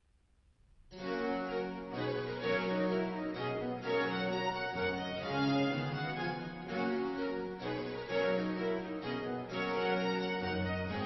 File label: Country dance; purcell; Masque from Dioclesian